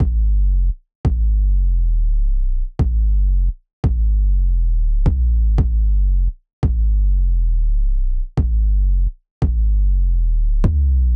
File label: 172 Bm Sub Drop